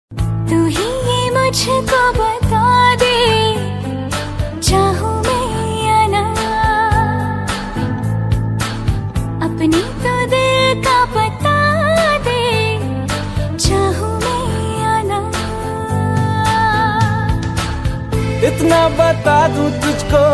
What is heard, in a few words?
hindi ringtone female version